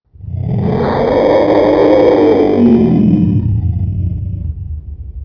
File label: Mus Roar